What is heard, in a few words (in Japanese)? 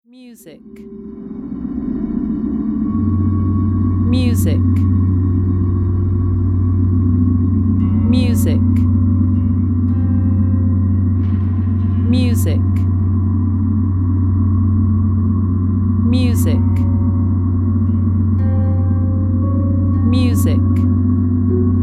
ホラーゲーム探索BGM